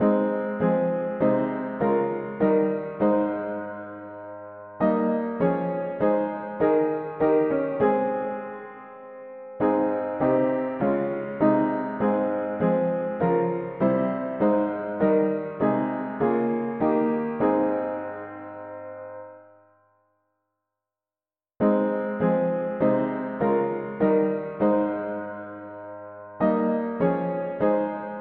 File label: Lead on Almighty Lord mono piano; hymns